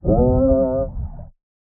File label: underwater scream male dark; soundscrate